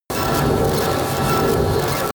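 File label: digital shredder, science fiction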